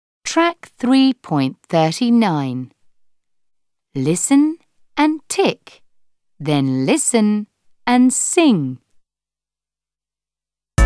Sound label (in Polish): Piosenka